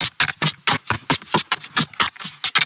perc cutlery and wood; Polyend; Recue; percs glitches and layers

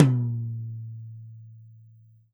8 tom; E-MU Producer Series Vol. 7 – Old World Instruments

